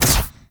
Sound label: Ghoul Drill Flame Burst; Warframe; Grineer Ghouls; Ghoul Drill Sergeant